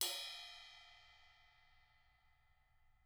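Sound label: CRASH 16 BELL, CYMBAL; CYMBAL KITS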